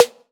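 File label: Snare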